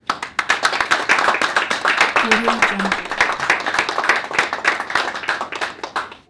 modest applause from a small group in conference room